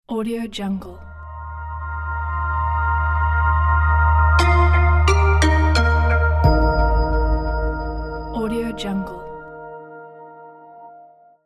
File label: cool logo